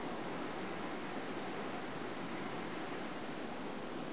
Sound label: wind; arab